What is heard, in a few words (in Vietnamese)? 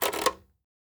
keo day ngan keo quay thu ngan cua hang